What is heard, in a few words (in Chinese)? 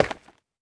玄武岩踩踏声